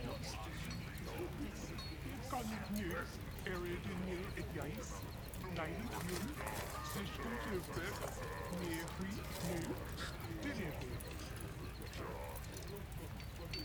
orc camp desert, ambiences; michael ghelfi

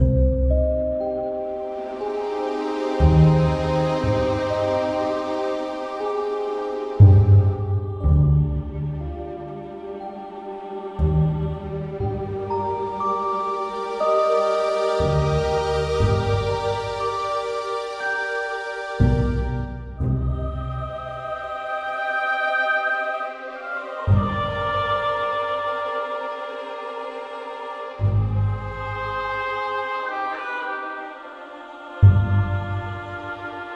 alchemy lab

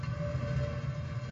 grinding loop; baileywiki maps premium towns

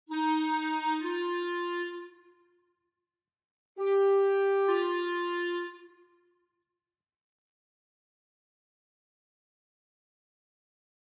Orchestra Clarinet; McRoMusic; Summoning Circle

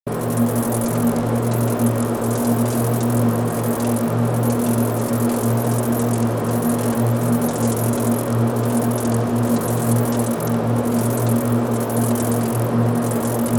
ambience scifi circuits; sonniss gdc

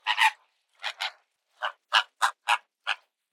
bird flamingos; sonniss gdc

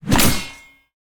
sword slash impact; ddumais

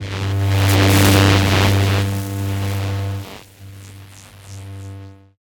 radio transmission frequency selection; bluezone; sonniss gdc

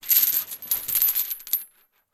chains metal movement distant moderate; Sonniss GDC

